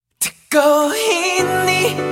Phantom Message Tone

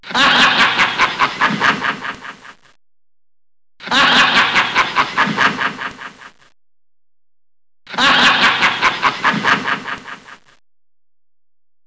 Evil Laugh

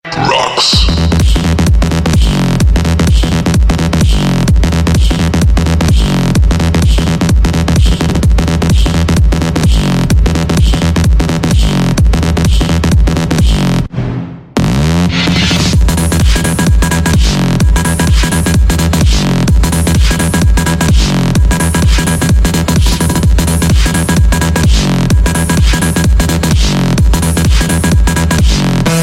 Mega Rington Bass

